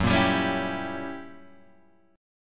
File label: F sharp 7th Flat 9th Guitar Open G, chord; 4,3,5,6,5,5